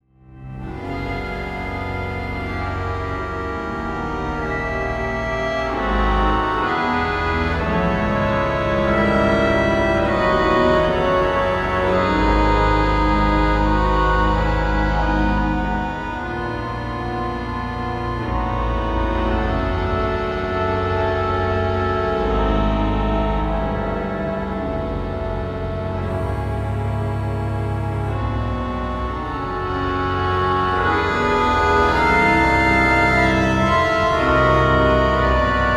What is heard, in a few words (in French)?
cor anglais